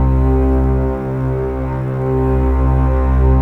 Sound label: Bass Cello, Cinematic